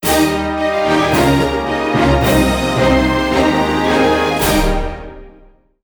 Royal Jingle; Penny-Punching Princess; PS Vita

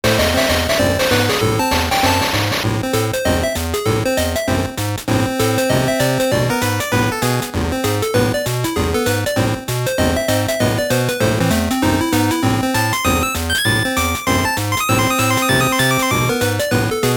Token Tango; gb

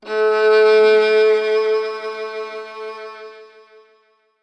Piano Walkway Violin A3; super crazy rhythm castle

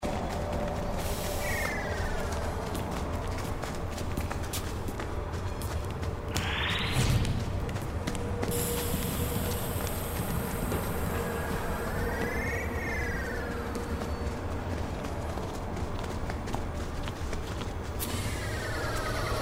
Station Environment (SFX); U.B. Funkeys

